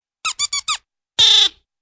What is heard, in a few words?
four squeaks and a raspberry